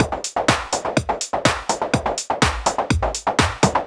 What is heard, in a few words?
124 Full, Drum Loops 124bpm; Tatanka; Nu Dance Electro